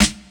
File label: Snare Hit